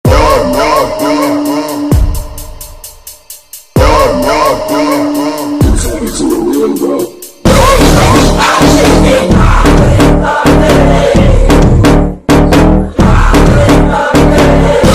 bgm ringtone full bass; post credit scene

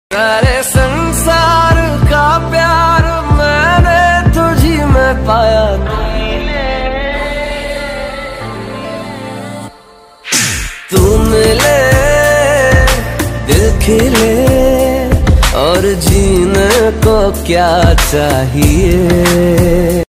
love slowed reverb song

